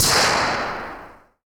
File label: Gun Shoot